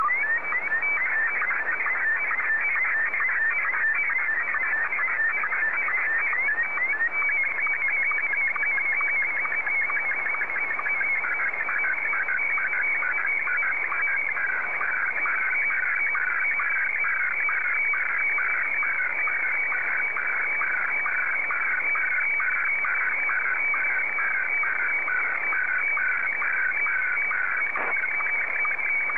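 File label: Martin 1, slow-scan television; JA3QJQ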